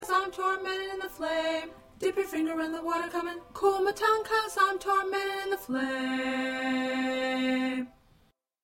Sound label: Alto 2; SSA